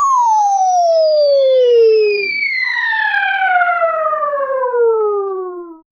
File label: cartoon slipping sound whi; falling